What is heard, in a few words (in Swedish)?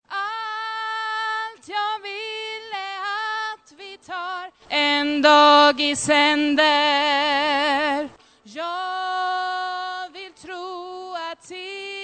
bas, stammor